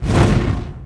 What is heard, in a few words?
Magic FIRECAST